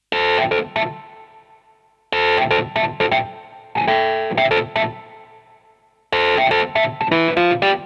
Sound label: beast attack; adarkroom